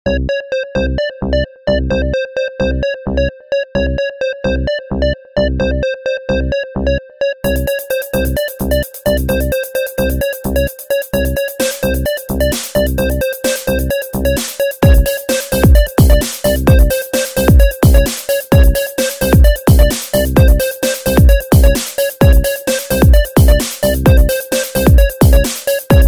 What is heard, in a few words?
Techno